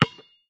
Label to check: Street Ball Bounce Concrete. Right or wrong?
Right